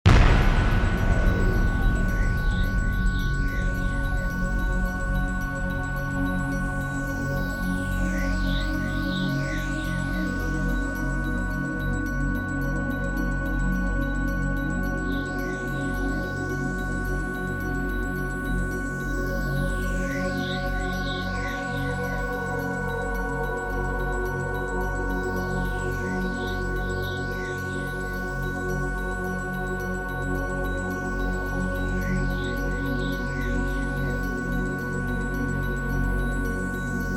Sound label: Space Log, Stingers; iLife Sound Effects